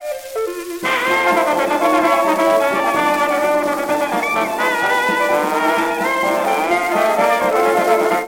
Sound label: jukebox